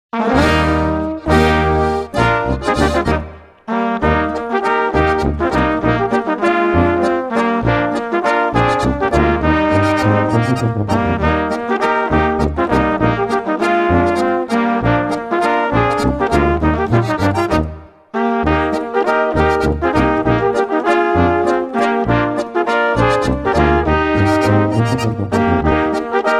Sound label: walzer